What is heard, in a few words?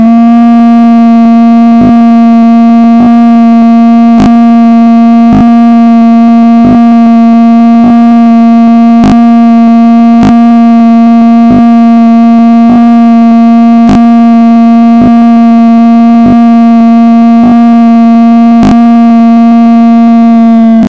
speak thinking vibrating tone